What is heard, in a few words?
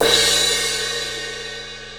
18 inch thin crash crash, cymbal; 602; Paiste